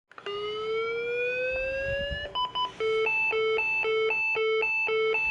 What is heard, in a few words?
Lp15 defib charge